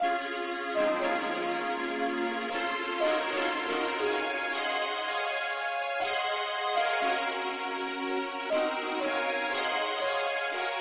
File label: pop uke lead key cis